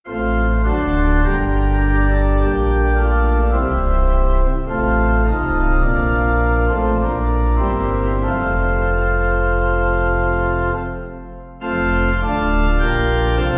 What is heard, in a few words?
Pipe